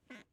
Wood Creak

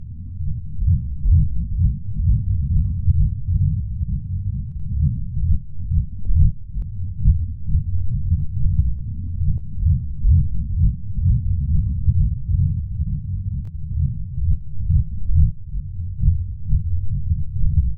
Low Frequency Pulsing Rumble

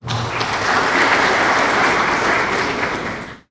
Applause Small

